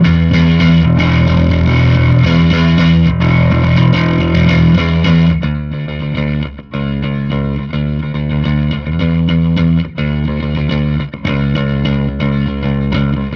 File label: hiwatt, bass